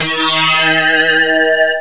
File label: 707 lazer synth